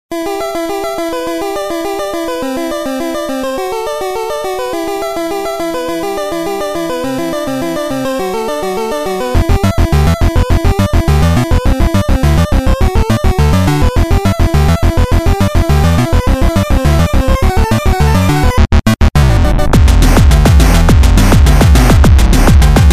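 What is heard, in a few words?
8-bit music without copyright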